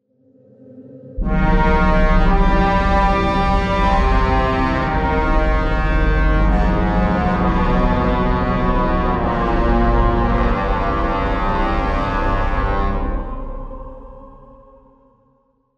Lose Battle; Inferno; heroes of might and magic online 2010 pc

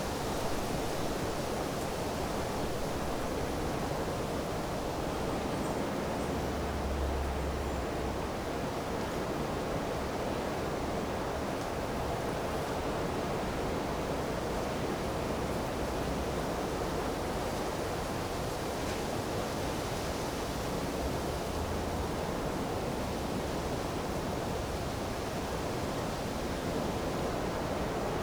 wind ambient; Lucius III; pc linux; tenkoku

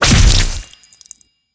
Boss Chain Damage; helltaker

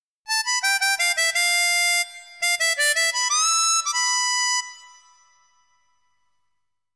Harmonica; Tales of Eternia

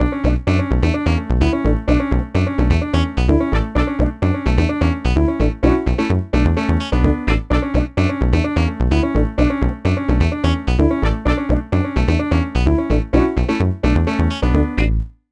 Punky Funky

